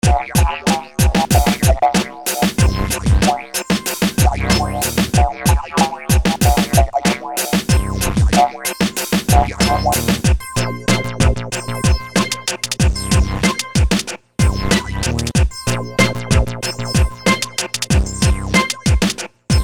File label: digeridoo